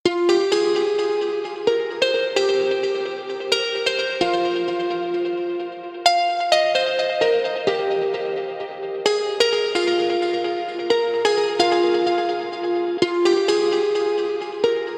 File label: great ringtone